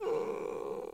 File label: Puppy Idle Growl Playful; Vocals; Warframe; Kubrow